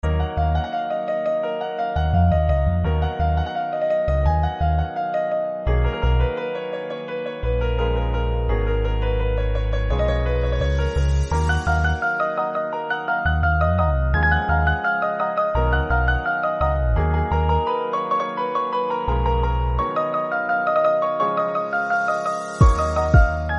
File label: Instrumental